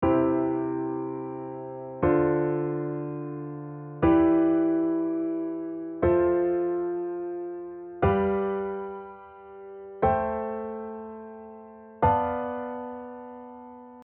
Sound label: C Diatonic Chord